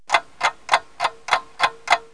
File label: Large Wind-up Clock Ticking; Wild and Wacky Sound Software; 1,000 Sounds; Household; Clocks